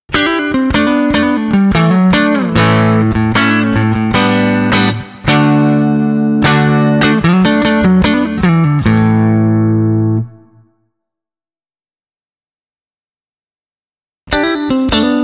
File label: Clean Single coil, guitar; panico pickup sc2 single coil high gain hot